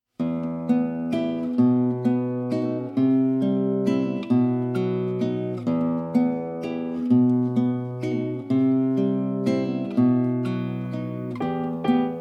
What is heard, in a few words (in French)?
instrumental guide chant; Comptines